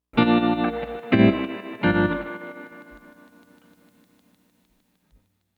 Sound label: Trem Guitar, 70s Analog Funk